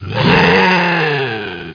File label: ogre wake; Quake